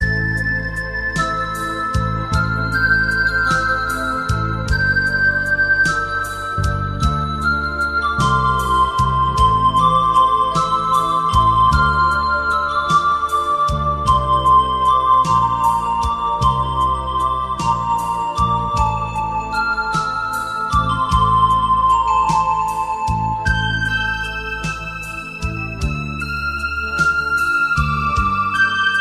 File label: Slow Melody